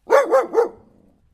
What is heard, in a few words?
Dog